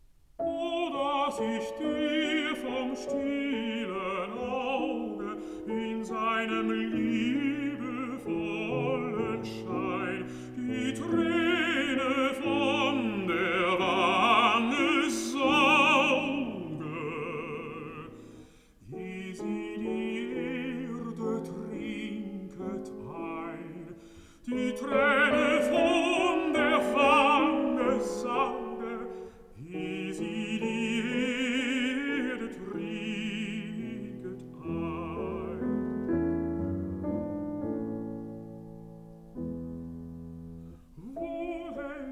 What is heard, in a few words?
song for voice & piano